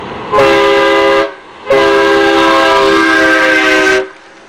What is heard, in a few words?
passing train